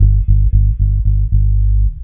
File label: bass loop, Ragga & Dub Reggae; AKAI; USB Soundscan vol.27